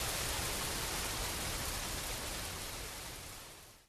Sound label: rain shower fadeout